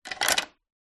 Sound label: household key mortice turn internal door lock; zapsplat